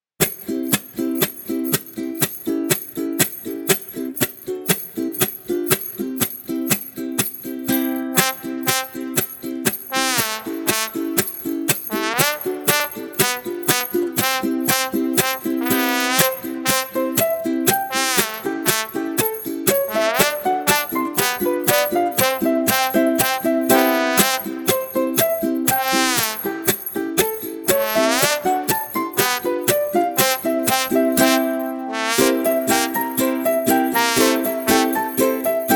Ukulele and Trombone Happily Bopping Along

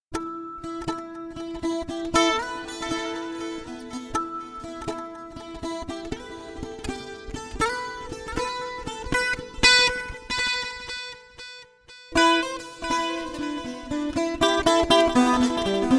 Am120 2x GTRs impro

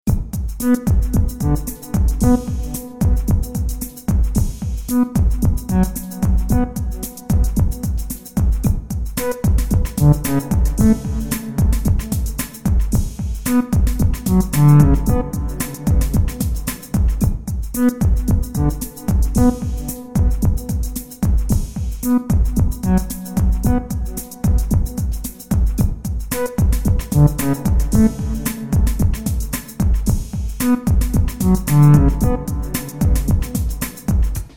Bm100 Empty Groove

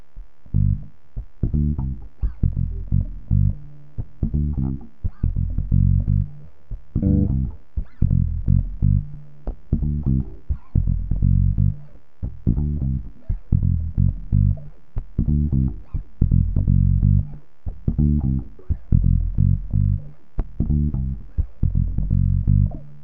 Funk Bass in Gmin

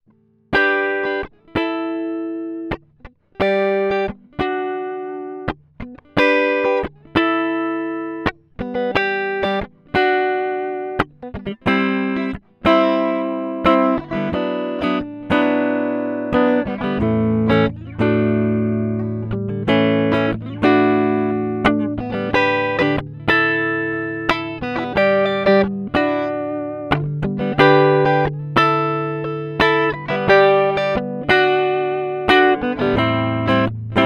riff

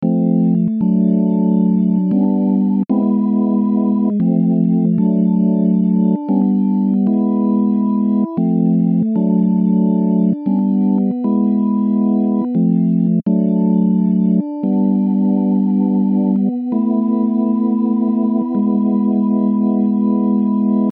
one handed organ pads

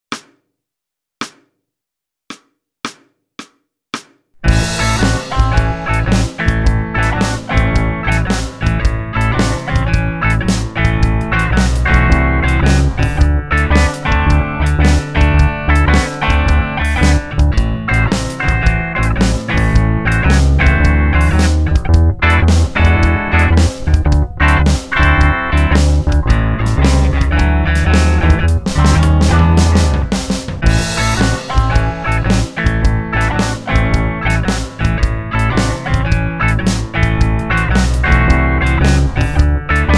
Simple Blues Rock In A 110 BPM

